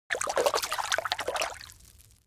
Fishing fish water movement fast splash

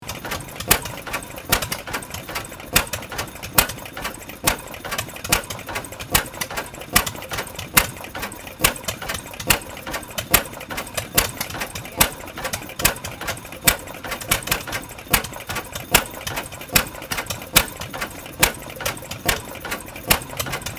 Machine room ambience machinery movement loop

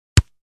American football kickoff

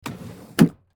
Small drawer closing; household

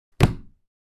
Hardcover book set down

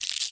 Cockroach being squashed

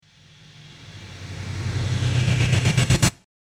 Cinematic whoosh glitch and cut

